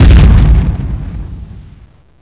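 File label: bomb explosion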